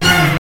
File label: DISCO FX; Zero-G; Funky Elements